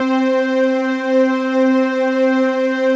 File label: digi pad, Pads